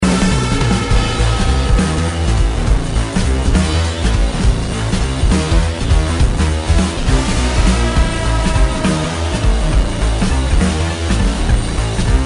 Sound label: loop; Danger Mouse - Ultimate